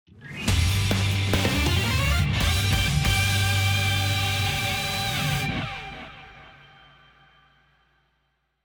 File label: Winning; Capcom Fighting Collection 2; PS4, Switch, Windows, Xbox One